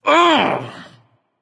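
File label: nonverbal ouch; smite; voicepack; merlin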